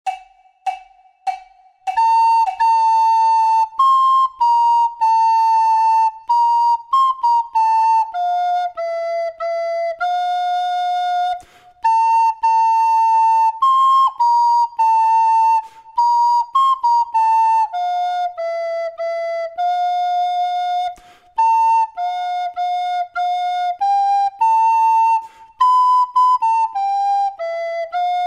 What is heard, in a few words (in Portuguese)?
DUETO FLAUTA; BLUE BELLS OF SCOTLAND